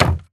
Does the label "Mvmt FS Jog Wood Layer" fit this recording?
Yes